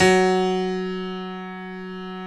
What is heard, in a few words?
GRAND PIANO; OMI - Universe of Sounds